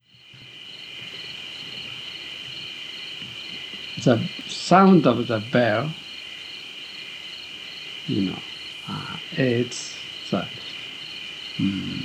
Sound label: 4 bell whole; audiobook